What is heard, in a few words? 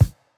japanese pop kick